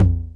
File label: TR 909 & Reso